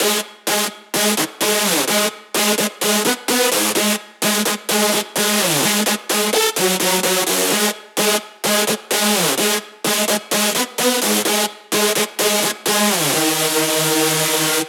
128BPM Synth layer wet noSC, Root G#min; Vengeance - Essential Clubsounds Vol.5; Demo Constr Kits; Break the Chains

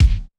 Korg 01W MegaDrums; 300 Drum Machines

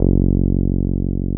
TB-303

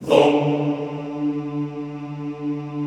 Classical Choir Hmmm D2; Best Service; Extended Classical Choir

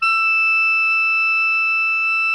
Soprano MF E 5, Saxophones; Greytsounds; Philip Wolfe David Crozier's Saxophones

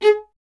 viola G2, Solo Instruments; Miroslav Vitous; Solo Instruments VOL-1

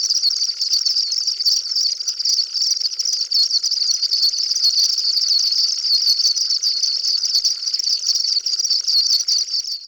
INSECTS, SYNTH FX; AMG; Global Trance Mission VOL-2

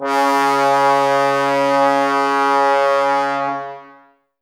Brass, Woodwind Brass Ensemble; Miroslav Vitous - Woodwind Brass Ensemble; Tbs

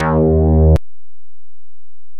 SAX BASS, Synth Bass

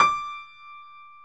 PIA HIGH G, Grand

